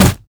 crit attack; Dr. Mundo

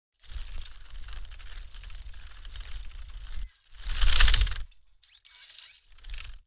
dance; orianna

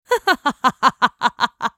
Ghost Bride laugh; Morgana; Character Voices; English

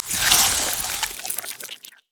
emote laugh; khazix

